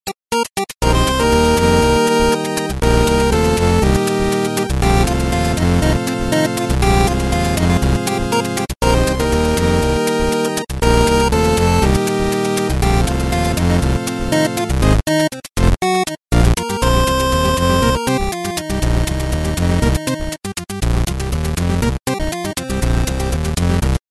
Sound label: Thriller; Infectonator 2